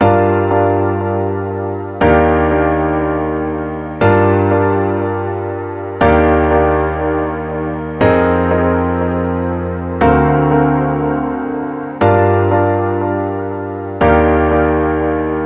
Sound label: Soulful Chords Bb Maj G Min 120 BPM, piano; nikos piano sample pack